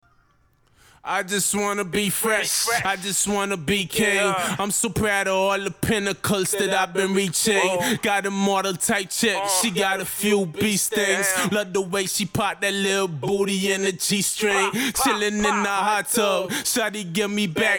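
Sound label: hip hop 138 G major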